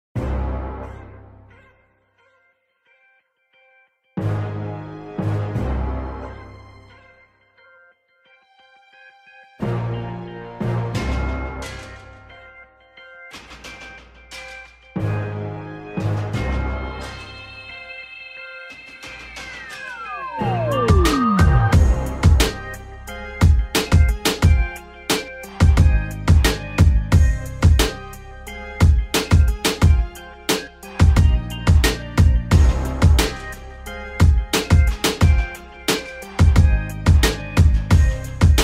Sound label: Epic Hard Influential Rap Vibe